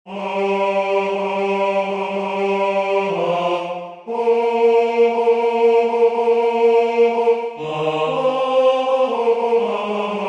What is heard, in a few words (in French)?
Priere du soir Tenor; Gounod